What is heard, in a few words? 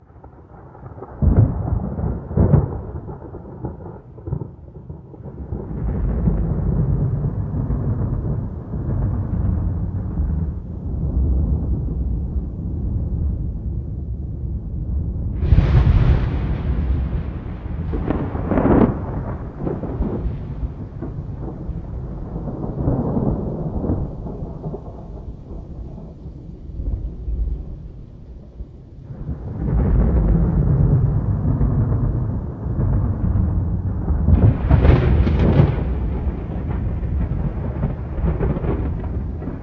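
Ambient Thunder loop; silent hill homecoming; ps3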